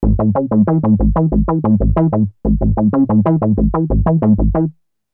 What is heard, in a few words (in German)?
novation peak wabbel